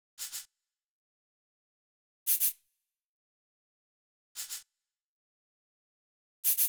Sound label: Cabassa, E 115bpm; Zero-G; Nu Disco; Construction Kits